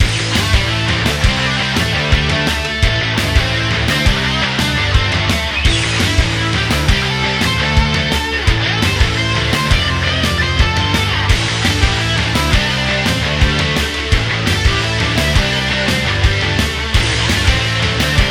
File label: 3d Music